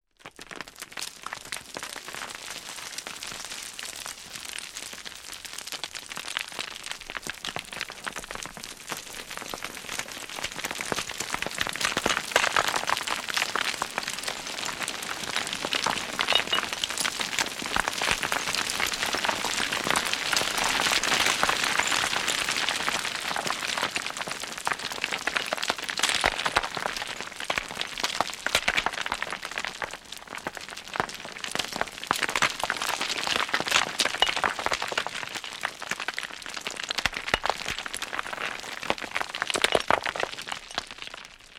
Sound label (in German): Geroell; Natur